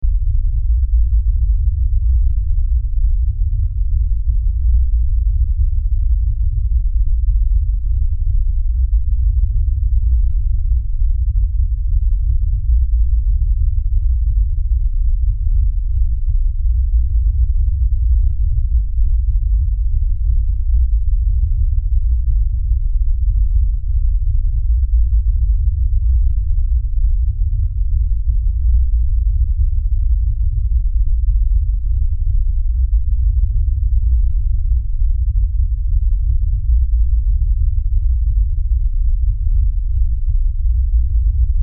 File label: LFE Rumble, Lfe Rumble Low Bass